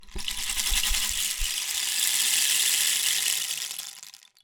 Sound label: Rain Stick, Percussion Samples